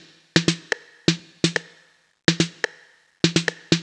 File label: drum loop verbe percussion 125, Classic Chicago House; Sample Magic; Drum Loops